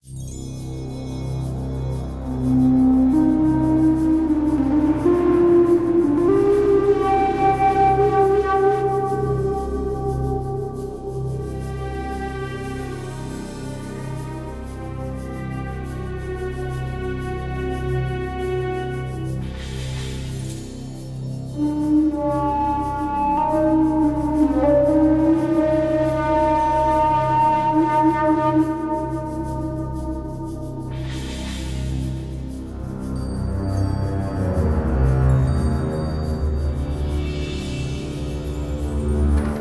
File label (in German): Music Arabisch; rise of venice windows gamerip 2013